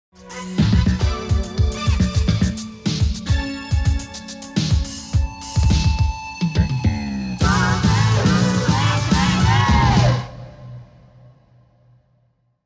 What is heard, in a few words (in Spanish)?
Jingle; 1987; Doble U efemeee